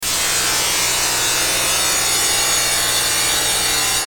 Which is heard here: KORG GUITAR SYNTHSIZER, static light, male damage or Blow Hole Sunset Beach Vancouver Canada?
static light